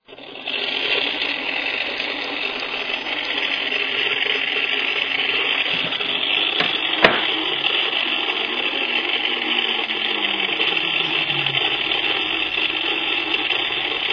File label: pump noise